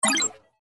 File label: find match